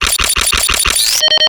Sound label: blacksmith tick five; freak fortress 2